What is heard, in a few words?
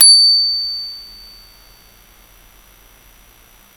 clutch bell